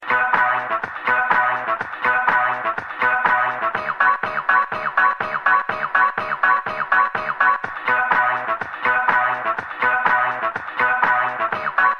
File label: vault music; sinners